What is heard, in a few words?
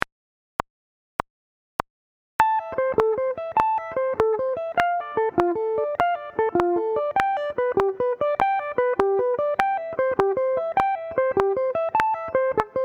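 Sweep Picking, guitar; Sweep Picking Part 3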